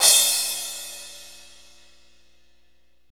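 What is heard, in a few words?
cymbal R&B crash, Motown, Roland; Northstar - Drumscapes Roland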